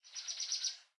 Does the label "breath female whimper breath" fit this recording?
No